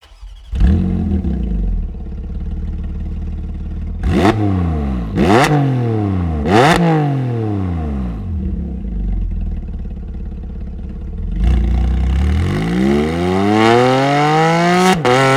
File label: EVOLUTION HEADER SET Porsche 718 Cayman GT4 RS